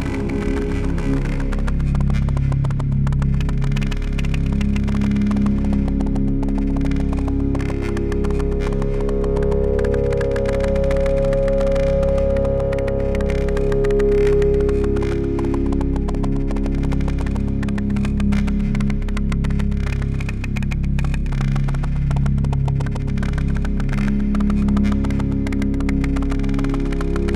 Electric Breathing; boneworks; bonetones original soundtrack

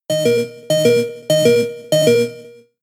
Health Low Loop; Pokemon; Gen 6; XY ORAS

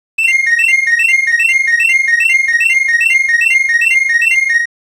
Battle Bells; super mario rpg; S'crow Bell

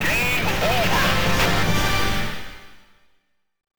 Chill Out (Game Over); Polygonet Commanders; Konami Polygonet hardware; arcade

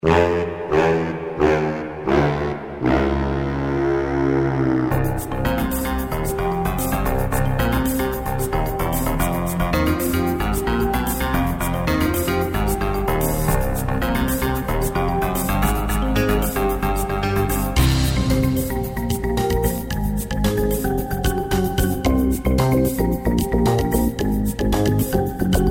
Lose Game; Weekend Warrior

